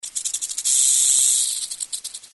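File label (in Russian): короткий звук трещотки у хвоста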